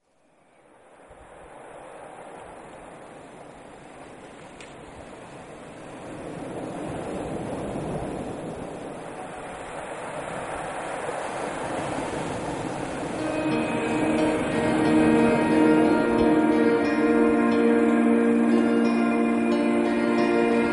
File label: Peaceful waves; beach